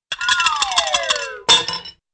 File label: metal rattles fall whistle and clank